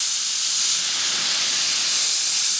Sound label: stalk gas escape loop; Half Life 2; Episode 1; citadel